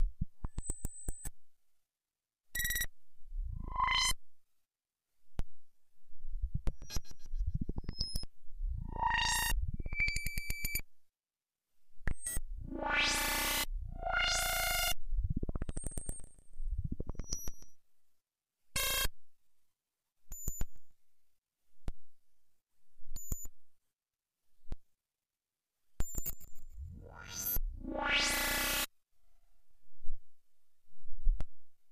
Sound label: SPACESHIP CONTROL INTER, sci-fi space fx